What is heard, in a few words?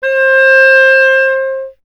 clarinet C; Miroslav Vitous; Solo Instruments VOL-1